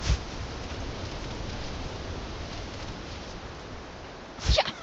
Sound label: climb; kena bridge of spirits